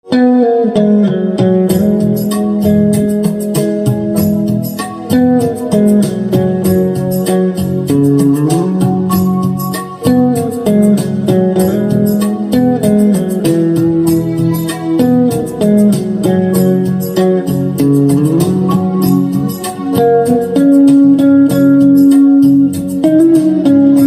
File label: Guitar